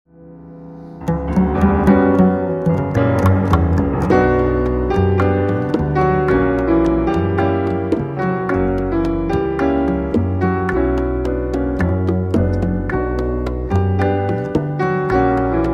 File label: Barcarole